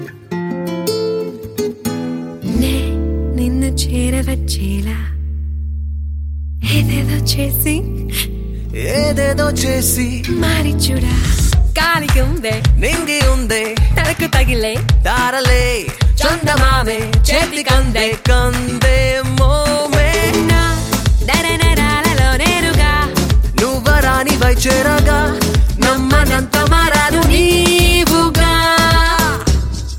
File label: Telugu